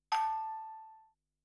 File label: Notification Sound; 7 Days Audio; nftbattleminers